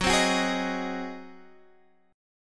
G sharp 7th F sharp

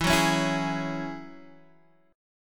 Gb 7th Suspended 2nd E

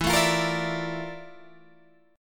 F Major 13th E, guitar chord

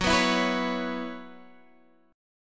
B Suspended 2nd Suspended 4th, Gb, chord

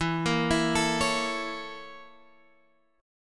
A sharp Minor Major 7th Flat 5th

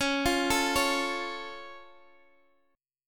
Db Augmented Db down Guitar Half Step Down

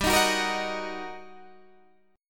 G Minor Major 7th Sharp 5th, down, Guitar, Open D

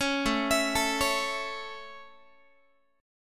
A Major, Guitar; arpeggiated

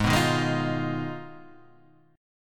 B 6th Flat 5th A flat, guitar chord

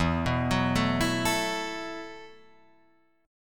E Suspended 2nd Suspended 4th E, Guitar, Open F; arpeggiated; JGuitar

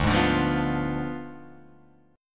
D Diminished 7th, chord

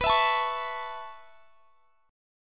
A Major Add 9th B, chord; Aadd9/B strummed

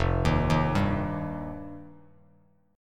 F 7th Suspended 2nd, Bass Standard; F7sus2; arpeggiated; JGuitar

